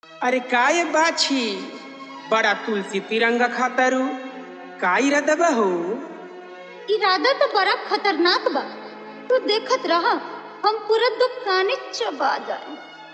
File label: Fully Dhollki Bass Mix